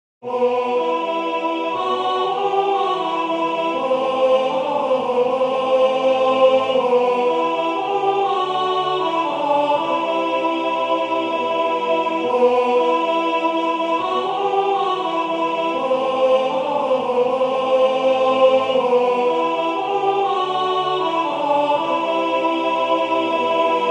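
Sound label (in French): soprane, Musique sacrée; Jesus nous croyons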